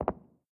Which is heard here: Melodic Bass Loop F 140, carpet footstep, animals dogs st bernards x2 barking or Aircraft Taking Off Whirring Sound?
carpet footstep